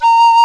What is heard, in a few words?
HRP RCDR&FLT